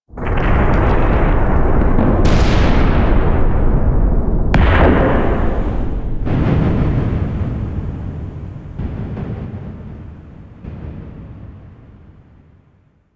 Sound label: Void Storm Explosion; Ambience; Warframe; The New War; Quests; Zariman